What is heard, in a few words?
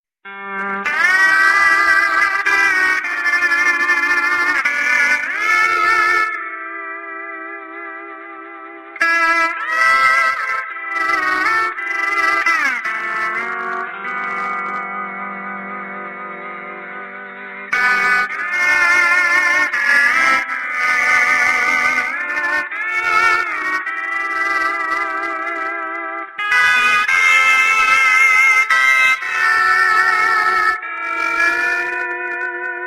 sad music; spongebob; meme